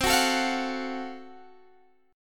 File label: Gb Minor Major 7th Flat 5th, chord